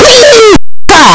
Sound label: pikachu cry; pokeyellow